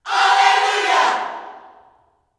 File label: ALLELUJAH, Vocal Planet Gospel YELL SHOUT; Spectrasonics; Vocal Planet Vol.1 Gospel; akai